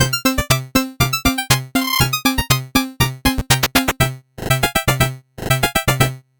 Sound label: 8bit jingle slow